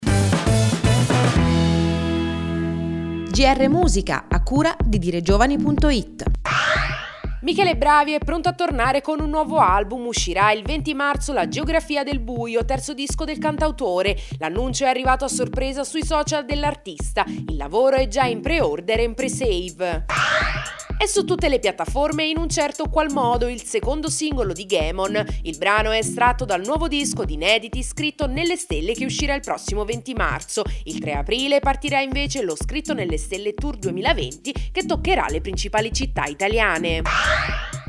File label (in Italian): musica